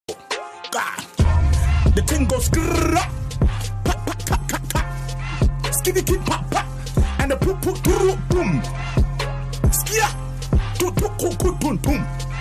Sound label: gun sounds effects